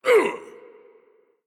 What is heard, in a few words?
hitreact human male large